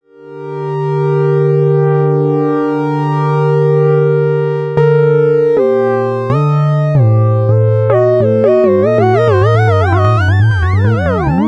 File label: Synthetic Transitions